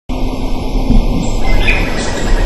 Chamaeza campanisona Bubbling call; PP Salto Encantado

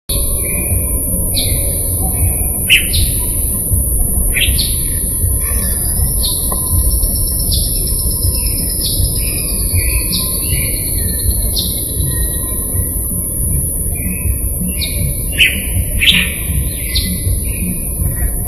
Elaenia mesoleuca; PP Araucaria; Jorge La Grotteria

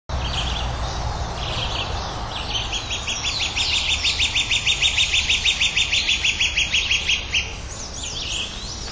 Dendrocolaptes platyrostris; Lagoa-Vermelha; Jorge La Grotteria

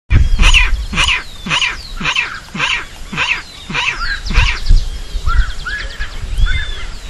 Pardirallus maculatus; Jorge La Grotteria

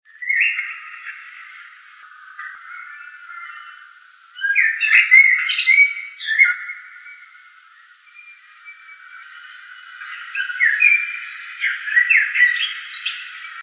merula, Mirlo Común (Turdus merula)

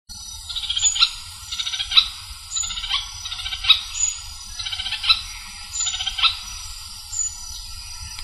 Synallaxis ruficapilla; PP Cruce Caballero; Jorge La Grotteria

